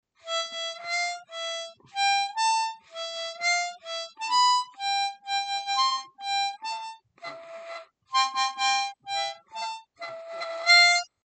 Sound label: clean, harmonica, Happy Birthday; I point the harmonica upward at a 45 degree angle